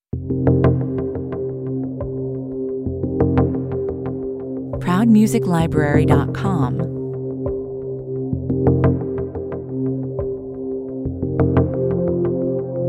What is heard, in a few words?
rumbling, 88 bpm